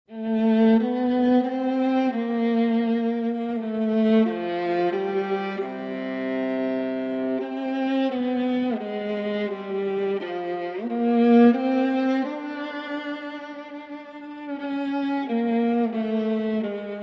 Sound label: Sonata For Viola Solo